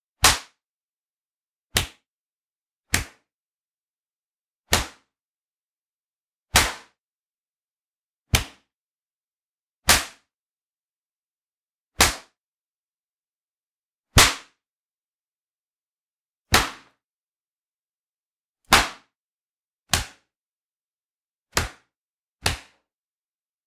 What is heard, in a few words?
Brutal Slaps